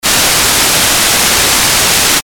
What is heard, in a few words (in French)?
bruit blanc